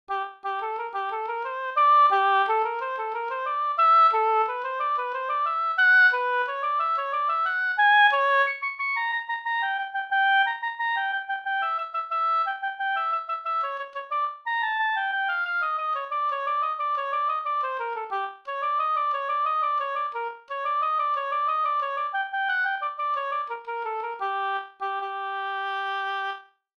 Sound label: Oboe